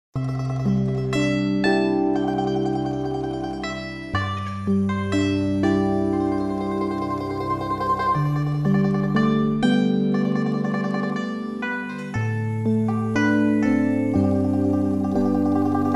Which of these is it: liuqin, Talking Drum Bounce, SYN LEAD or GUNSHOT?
liuqin